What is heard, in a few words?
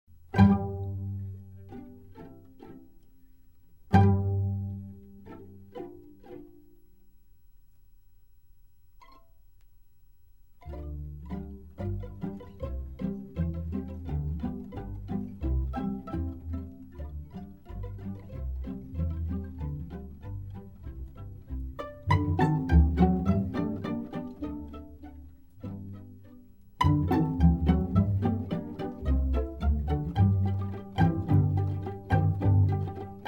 Pizzicate-polka (dry); Strauss